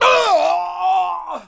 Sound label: Male Death; gamerip; Mars Sortie